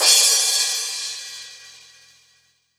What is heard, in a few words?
Crash, Cymbals; Mutekki Media; Vengeance Electroshock Vol.2